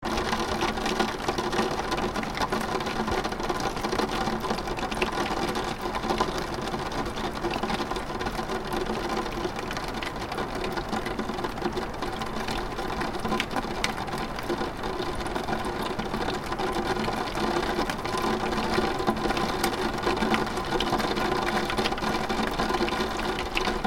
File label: Rain on window interior perspective